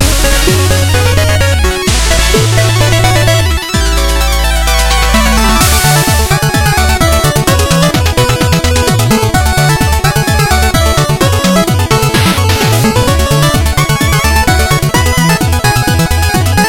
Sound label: Music Airship Upgrade; Shovel Knight King of Cards